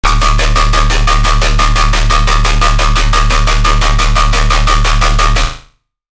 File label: Bass; Death Roller; Routed Sound; Vital Expansion - Chaos Bringer Vol.1